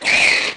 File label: Arachnid Die, Aliens